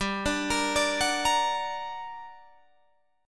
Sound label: G Minor Major 7th, down, Guitar, Open G